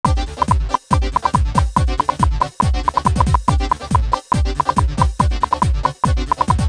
techno processed; pertusa; generated sounds Leveau

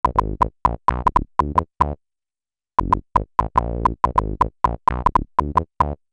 synth bass

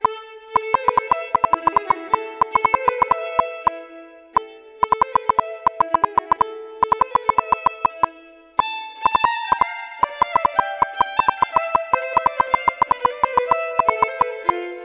violin

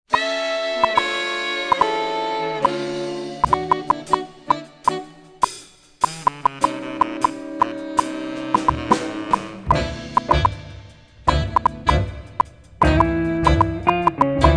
jazz

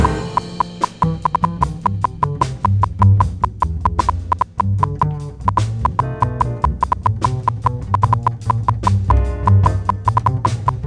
jazz

